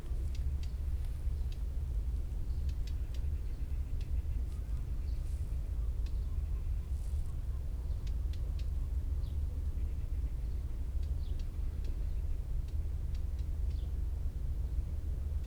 warbler sykes Iduna rama

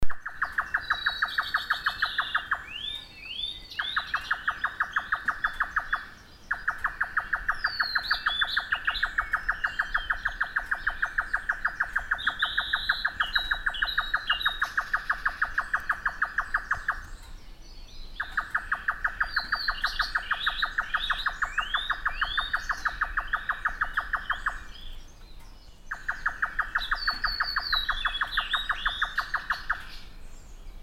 nightjar grey Caprimulgus jotaka

